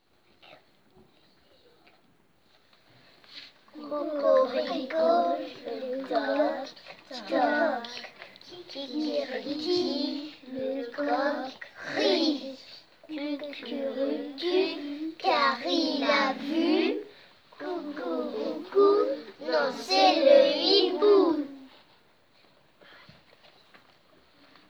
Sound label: Vocal